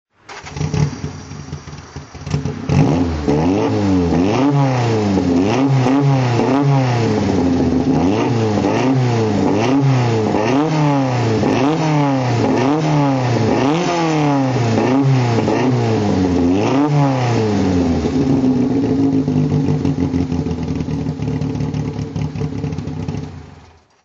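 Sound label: honda s800 throttle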